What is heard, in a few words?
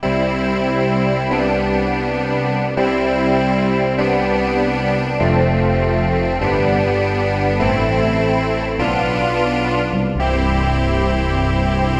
Choir; Super Gemini; Prophet VS